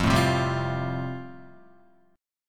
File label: F Major Add 9th down, Fadd9 chord, Guitar; strummed; JGuitar